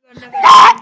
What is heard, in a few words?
scremm